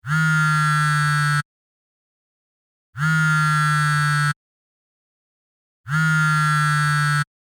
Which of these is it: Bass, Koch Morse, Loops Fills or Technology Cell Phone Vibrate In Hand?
Technology Cell Phone Vibrate In Hand